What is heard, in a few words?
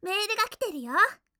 new mail; Windows; Madobe Ai